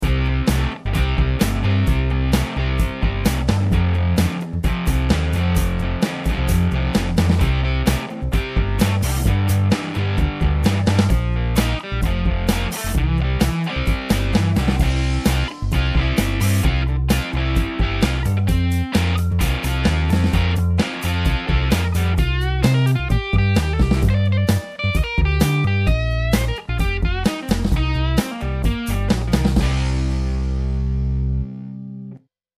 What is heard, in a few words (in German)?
fuzz dreiviertel auf; MEK Classic Fuzz CF-1